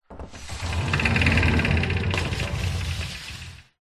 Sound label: household wooden sliding door roll light room reverb; zapsplat